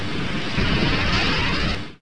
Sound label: Rocket; Waterloo